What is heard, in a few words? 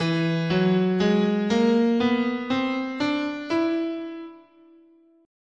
E Lydian Minor, scale